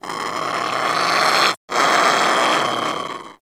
Sea Bird Vocal Snore; Warframe; Ostron; Hunting Critters

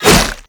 Whoosh, Weapons; Infested; Coda Caustacyst

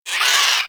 Avichaea Vocal Death; Hunting Critters

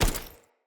Foley Action Default Body Hit; Player

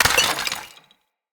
Vase Clay Small; Vases

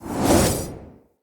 Long Sword Whoosh Heavy; Weapons; Mother

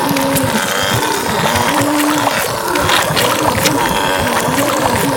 Regurgitate Proj Loop; Warframe; Warframes; Grendel

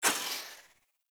Player Dash; Warframe; Caliber Chicks Minigame

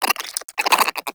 Cryptilex Vocal Idle; Characters; Warframe; Hunting Critters

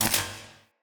Cannon Tesla Charge Zap; Weapons; Player

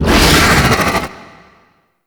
golden maw attack vocals; Gameplay; Warframe; Ambience; The War Within

